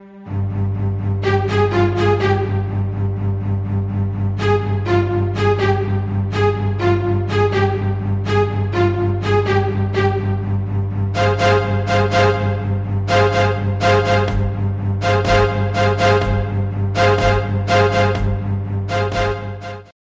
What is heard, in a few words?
marcato detache